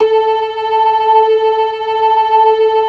LO MAN V L, ORGAN LOWER MANUAL; Keith Emerson Signature Series

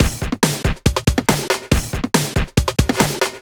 Break Beats, 140 BPM; Vengeance - Essential Clubsounds Vol.4

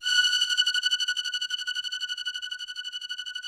ROUGH STRNGS, Yamaha VP-1; Music Store

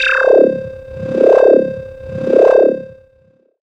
syn, Dance Synths, Wasps; ProSamples vol.37

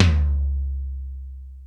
Toms, E-MU Pro-Cussion

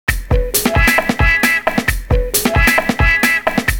another riff; Univers Sons - Kitch 70s

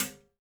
metal; Spectrasonics; Liquid Grooves 1 & 2; METALS

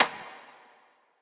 Clap, Drum Percussion Single Hits

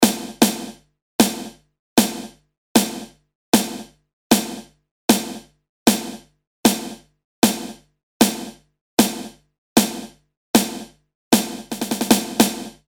In A Room, snare; Industrial

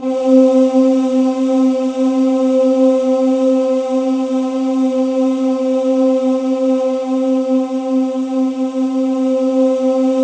C3, Synth Leads

